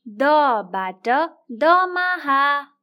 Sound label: damaha; grade1; nepali; ta na china